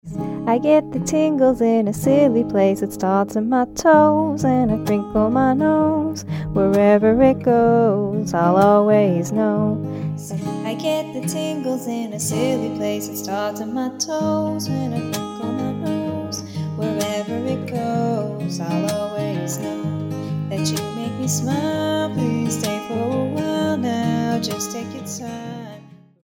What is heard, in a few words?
Vocal Tuning Sample